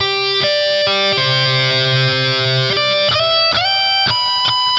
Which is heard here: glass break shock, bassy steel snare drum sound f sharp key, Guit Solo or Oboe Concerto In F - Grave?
Guit Solo